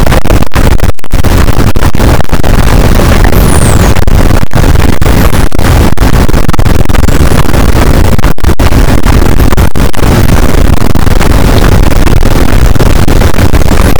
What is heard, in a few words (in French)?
cachalot positif, boat; Physeter Macrocephalus